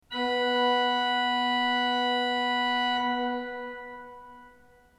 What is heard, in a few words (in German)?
ton, orgel